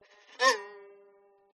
violin staccato dynamic; aston stealth

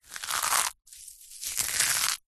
egg shell already broken crush crack in hand; zapsplat

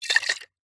alcohol spirit bottle short shake movement splosh; food drink

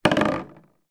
household shampoo bottle put down on hard surface

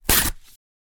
Cardboard Tear

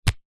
food orange catch

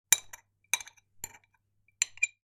fork dig into fries on plate

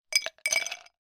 food drink ice cubes movement in glass

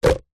sport cone plastic stack others

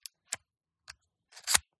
Ejecting SD card

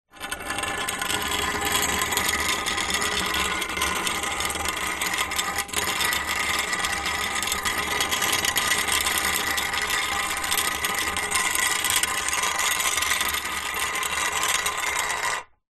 household hoe metal drag on concrete

